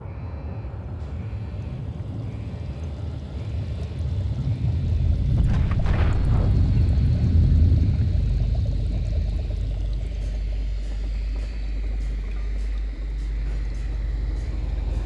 ambient one; X2 Wolverines Revenge

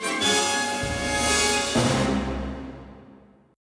victory, music

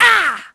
damage; Pulse Racer

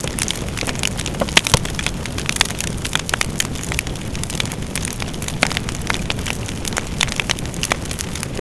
Fire Small; Max Payne

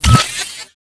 Bronze; Quantum Redshift

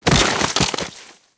deadfall grass

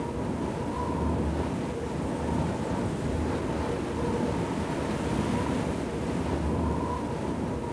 wind cliff face ambience; Star Wars Obi Wan; XBOX

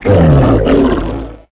hit; tusken King; Star Wars Obi Wan; XBOX

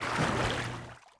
water wade

